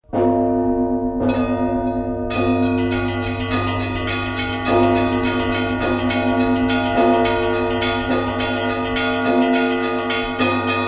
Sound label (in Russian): krasnyj zvon